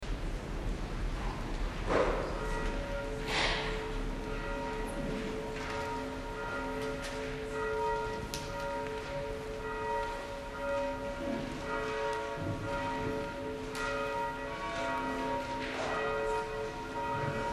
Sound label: Bells and Pastoral Greeting; Memorial Service